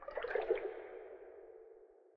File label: trigger water push; Minetest; backrooms